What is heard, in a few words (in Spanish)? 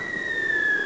caer; RPG Maker; Legend of Heroen v1.20